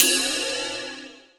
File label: Hats Electro Open